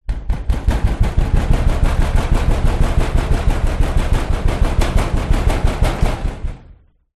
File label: impacts metal wall fist hit multiple times hard; zapsplat